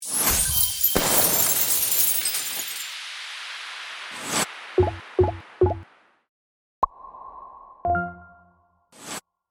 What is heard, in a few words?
reveal; Tournament; Tournamania